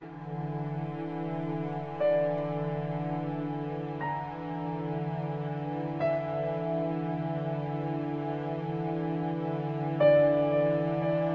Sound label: suspicious piano violins intro